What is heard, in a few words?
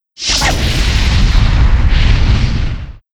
Meteo; Fly For Insanity